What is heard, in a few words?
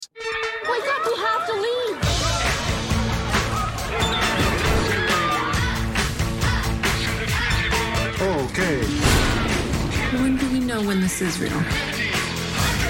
whitenoise